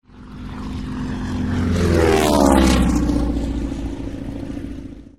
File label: t6 passage